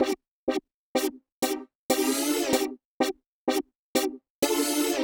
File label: Fooger Mod A 95 C, modular 95bpm